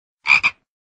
frog noise

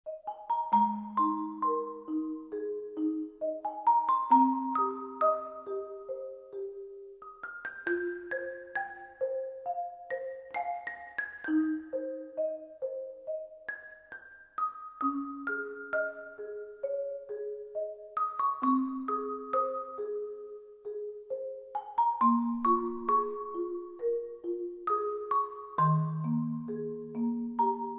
Marimba

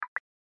pop up windows xp

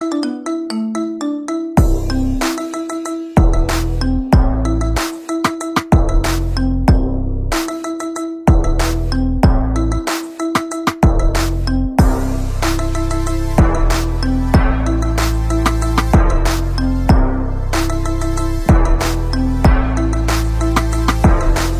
BEATBOX Marimba

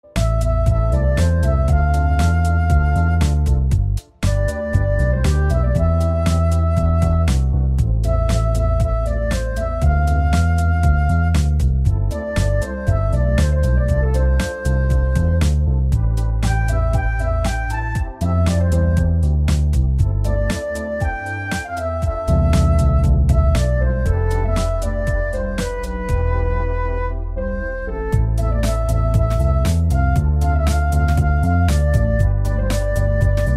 Flowers Floete

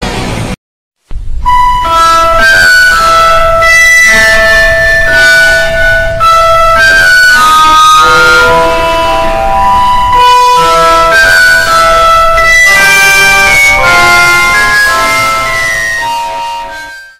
earrape meme; harry potter

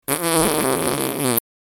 Farting sound

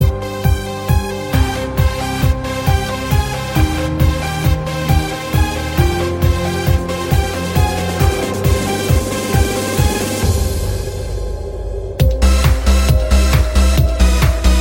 Halloween Tech Mix

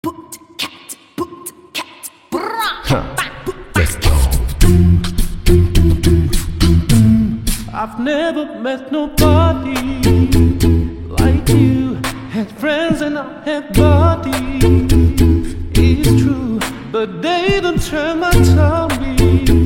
Acapella; Turning Red

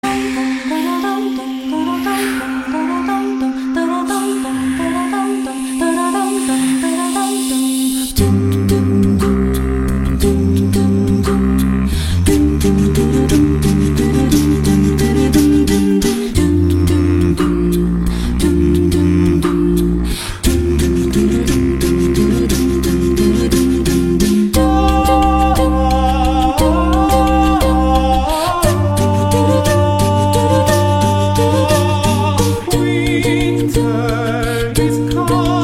Theme Acapella

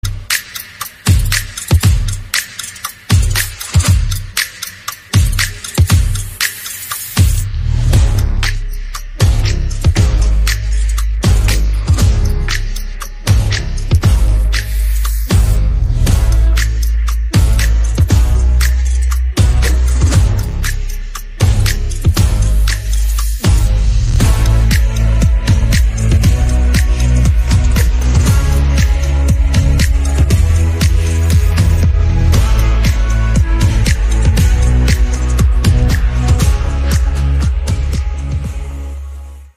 Instrumental

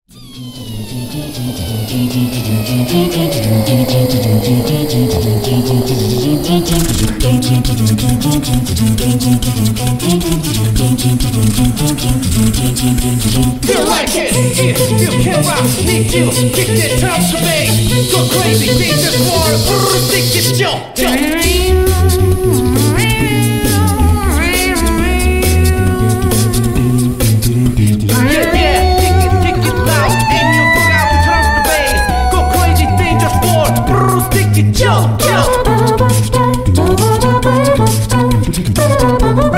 Acapella; Jojos Bizarre Adventure

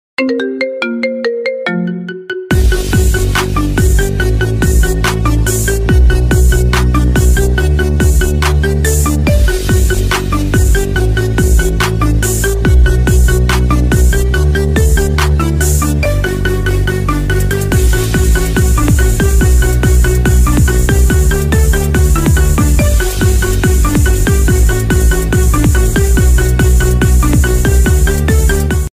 marimba Remix Ringtone

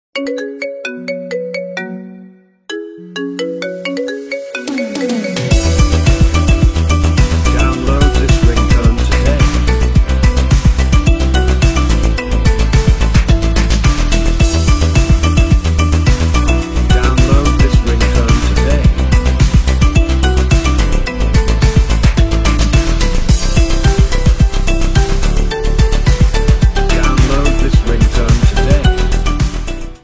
Marimba Remix

